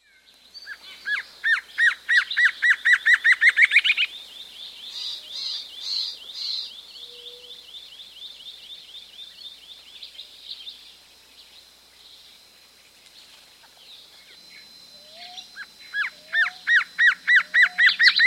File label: Black-Crested Antshrike, Nature's Songs, S. America